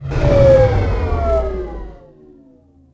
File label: shut, ships; Quantum Redshift; kumiko